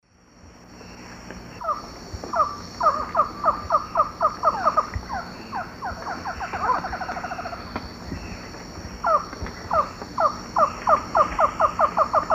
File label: Thamnophilus cryptoleucus, duet; S. America; Eastern Lowlands